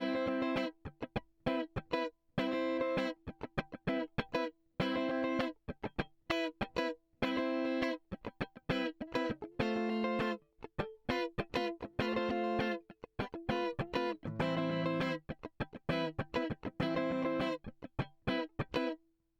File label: Guitar Rythm Comp